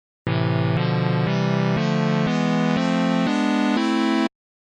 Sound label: diatonic harm minor chords